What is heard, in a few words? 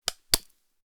Crushing ice with an ice pick